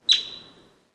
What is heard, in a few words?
Yellow-bellied Marmot